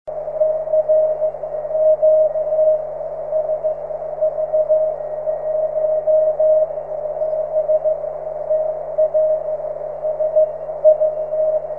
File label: cw; 70cm